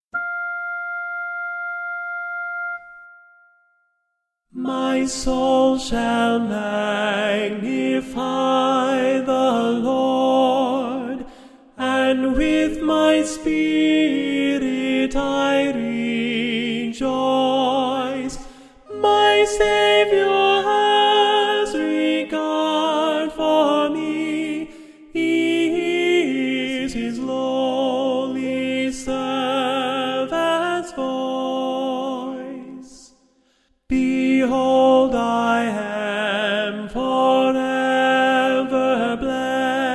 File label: magnificat alto